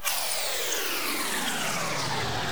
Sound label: sfx sci fi; Masterbits - Switch FX